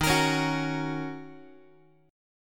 D sharp Minor 7th, Guitar; strummed; JGuitar

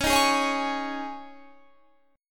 Db Suspended 2nd, Guitar, Half Step Down

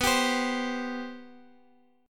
C 5th B; C5/B strummed

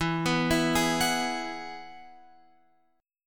E Major Add 9th E, Guitar, Open F; x,11,11,11,11,13; arpeggiated

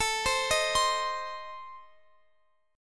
A Diminished, down Guitar Open F; arpeggiated

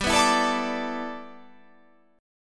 G 6th Add 9th

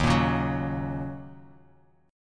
D sharp Minor 7th; strummed; JGuitar

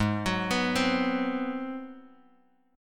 G sharp 7th Sharp 9th, Bass Standard; arpeggiated; 16,21,21,17; JGuitar

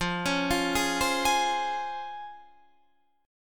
C sharp Major 7th, F, guitar chord

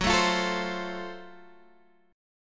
F sharp Minor Major 7th Flat 5th F sharp down

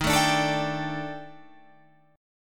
Eb Major 7th Flat 5th, Guitar, Drop D; EbM7b5; strummed

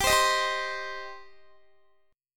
G Suspended 4th Sharp 5th, Guitar; Gsus4#5 strummed; x,22,22,20,19,19

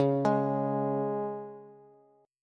Db 5th Db down Bass; Db5 arpeggiated; JGuitar

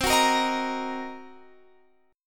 C Minor 7th Flat 5th Guitar Drop D, chord

